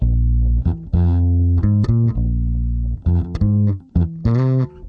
098 groove bass; MELODIC LOOPS

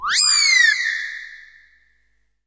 acid squeal

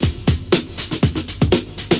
120 roll it on hard, drum loops

